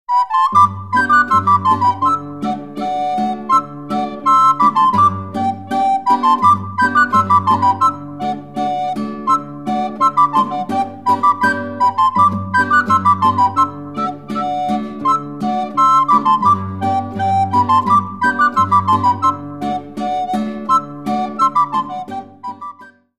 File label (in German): Schottisch; Beim Bimperlwirt